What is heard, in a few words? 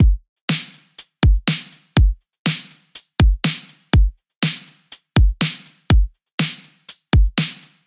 122 BPM Battery Full Drum Forge; full drum kit